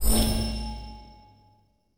rollover; ui; dota

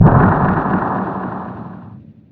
super nova begin; weapons; dota; phoenix

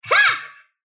sylph attack short; dota; dark willow

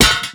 weapon drop common

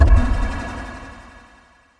projectile; weapons; arcana; dota; earthshaker

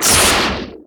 attack; storm spirit

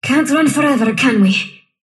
sylph death; dota; dark willow

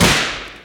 alt attack; weapons; dota; ember spirit

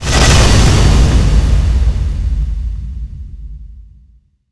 echo; dota; earthshaker

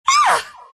scream; dota; crystalmaiden

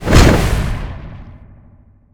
tower projectile; damage; player; physics

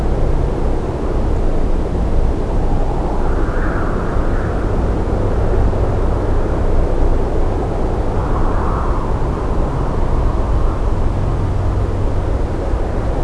default night lp, ambient soundscapes; dota

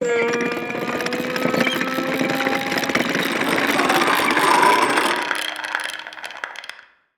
sharpshooter channel; weapons; dota; hoodwink

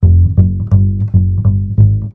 fish precast; dota; crownfall

